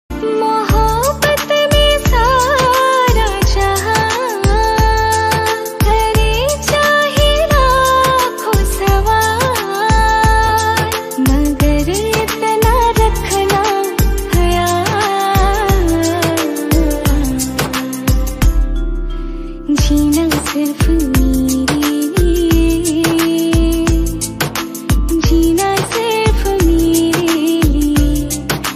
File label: recreated cover song ringtone hindi unplugged